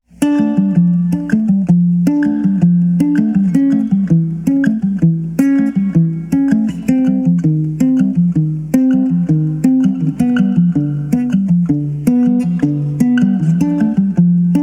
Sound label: Arpeggio Bari; Poetry Project